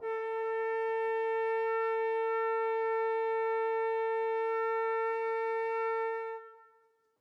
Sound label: flute trombone; interpolator